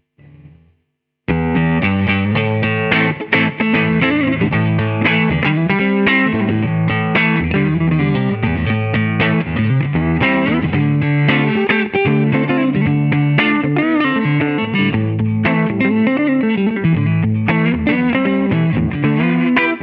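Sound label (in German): Halspickup, guitar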